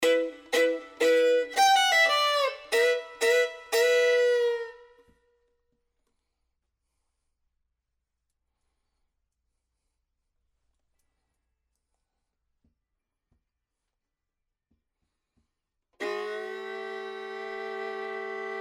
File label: fiddle